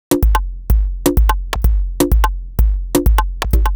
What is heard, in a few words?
127bpm, House Drumloops; Zero-G House Fabrik Drumloops; ZG Drumloops